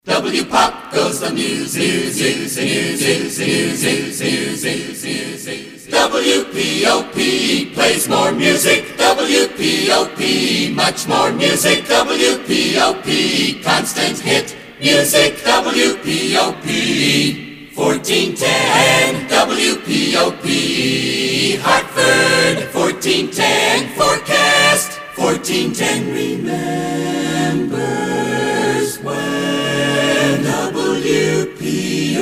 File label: Acapellas, jingles